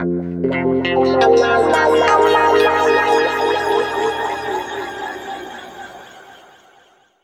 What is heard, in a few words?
F P-S WA -R, Guitar; East West; Steve Stevens Guitar Samples Collection; PTCH-SHFT+WA